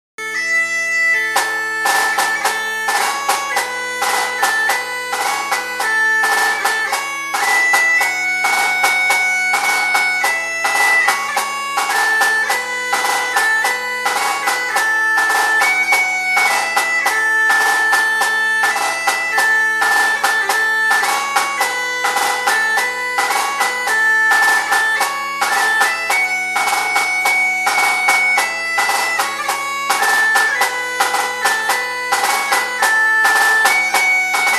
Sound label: auld lang syne Reel Snare